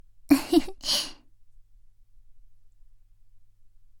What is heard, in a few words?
laugh, ASMR; Garden; WithRin-Nazo01